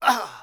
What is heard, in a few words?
damage, thief